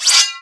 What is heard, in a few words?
CROSS SLASH hit